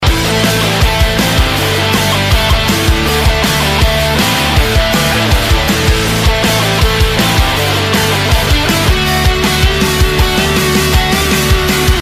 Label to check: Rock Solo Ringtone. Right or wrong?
Right